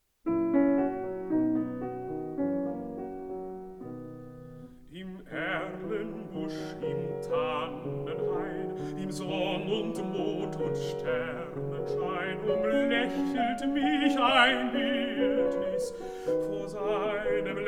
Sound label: song for voice & piano